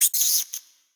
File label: rat drop loud